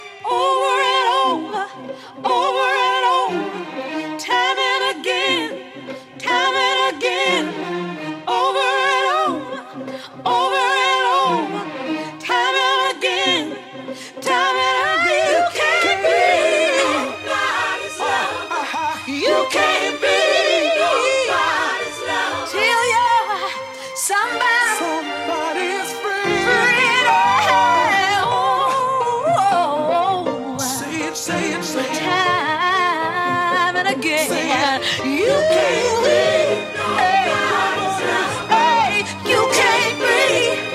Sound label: Accapella